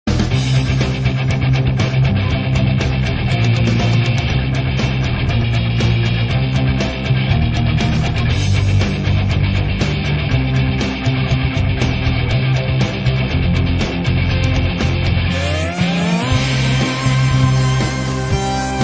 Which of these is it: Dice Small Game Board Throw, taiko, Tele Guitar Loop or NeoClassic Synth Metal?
NeoClassic Synth Metal